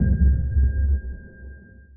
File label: sonar Tail Suit Medium Shuttle; Barotrauma; Real Sonar